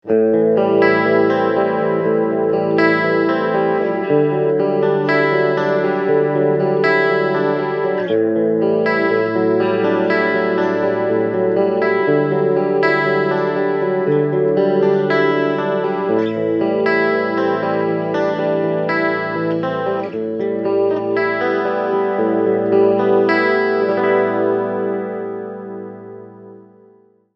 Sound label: Clean Chorus